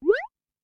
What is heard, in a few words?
Finger Swipe; In Tutorial; hello kitty friends match; android